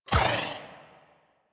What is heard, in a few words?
Dodge Impact; Warframe; Warframes; Pacifist